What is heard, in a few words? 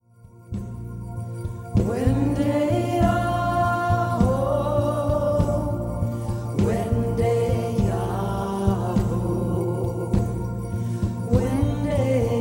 Cherokee Morning Song